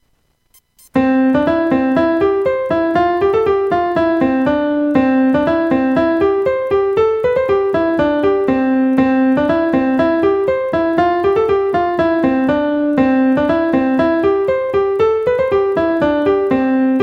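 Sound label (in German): Etüde in C-Dur, Marimba mit 4 Schlegeln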